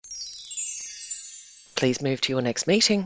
magic chimes descending please move to your next meeting